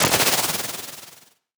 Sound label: rolling